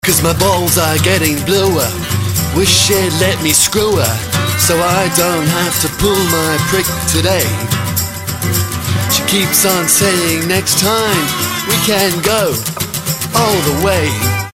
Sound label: Ringtone